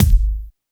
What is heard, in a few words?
R+B KICK; Drums In Your FACE!; BONUSKIK